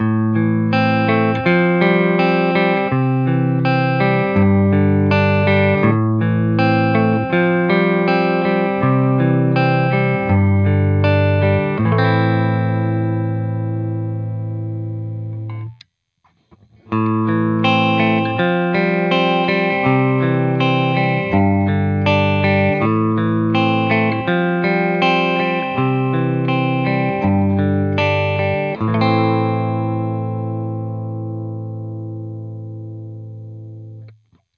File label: clean; fralin paf